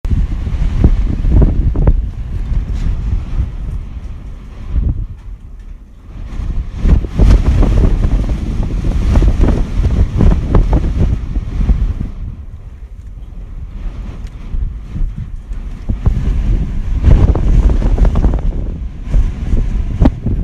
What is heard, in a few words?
wind sound from inside the hab